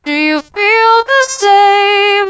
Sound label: brass, vocal effects; DDSP